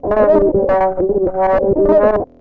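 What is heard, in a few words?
rhythm loops, 100 bpm; Rarefaction - Poke in The Ear With A Sharp Stick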